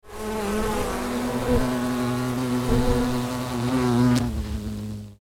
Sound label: Bees and wasps buzzing insects; animal